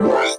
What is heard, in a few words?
SMG